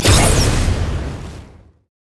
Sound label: flamethrower; rumble skin03